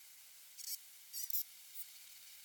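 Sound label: pacific white-sided dolphin chatter; salish sea; not yet heard locally but present; old humans cant hear these well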